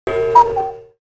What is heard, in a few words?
Gong Cuckoo